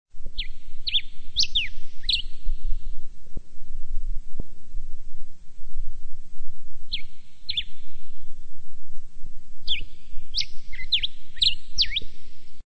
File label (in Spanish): diuca, aves chile